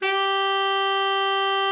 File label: TENOR, tenor sax; Best Service; Killer Horns